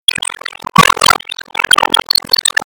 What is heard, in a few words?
Guitar